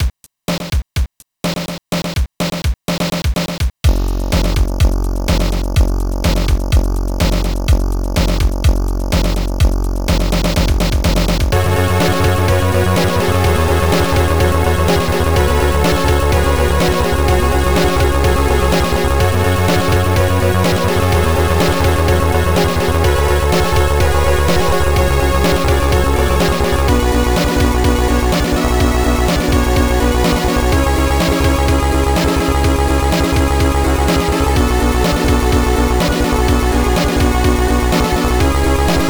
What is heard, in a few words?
amiga in game; turrican iii